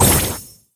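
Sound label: attack hit; gene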